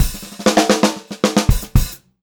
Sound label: POP FILL, Pop-Rock Drumloops; 108 POP